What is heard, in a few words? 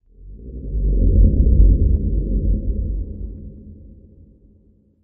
moan, ambient; STALZONE